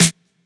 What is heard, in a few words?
snare west coast